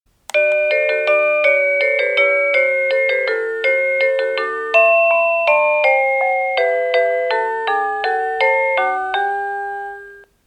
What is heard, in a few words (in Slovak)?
Ukážka melódie zvonenia zvončeka